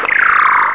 lance; Abaccus 1.5

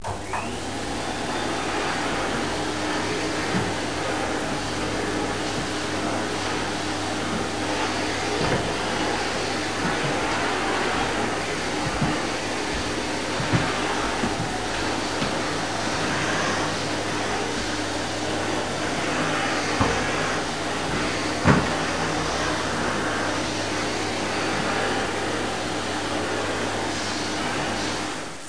hoover; Wonder's Greatest Toyshop on Earth

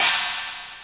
chi cym, midi

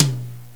Mid Tom L; GMPlay; Drums; XG

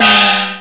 drums gong; Atari Mega Archive

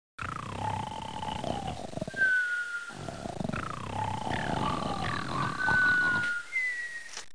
snor

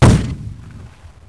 MORTAR

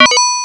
Cash; TreasureMath

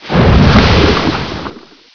water, airfist, weapons; Quake; PainKeep

